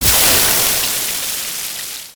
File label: Steam Sizzle Acid Burn